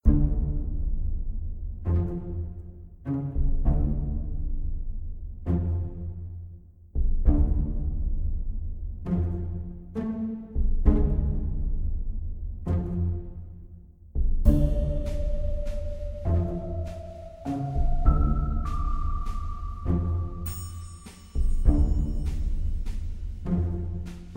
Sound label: Haunted Forest Loop; Steamworld Quest Hand of Gilgamech; nintendo switch